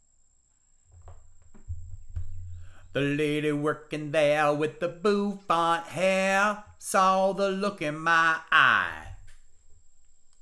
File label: vocals